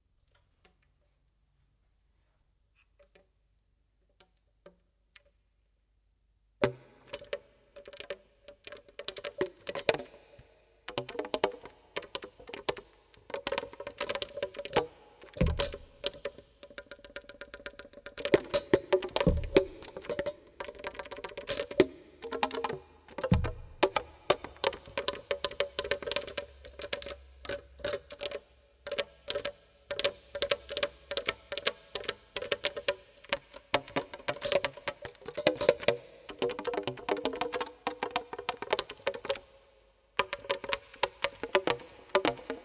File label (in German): bcl Solo innere Geraeusche